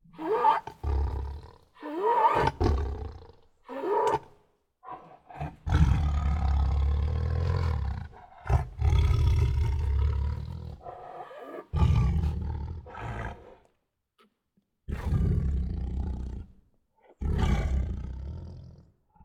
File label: Two Tigers Make Many Throaty Breaths and Growls; Sound Ideas